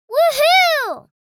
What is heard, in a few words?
Woo hoo cheering girl child kid